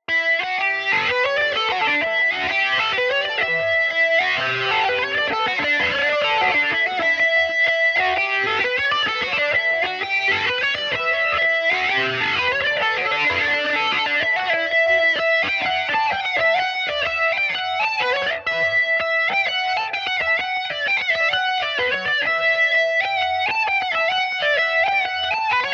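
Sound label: E dorian revelation distorted, irish mandolin; The Angle Grinder; heavily distorted Revelation Jaguar electric mandolin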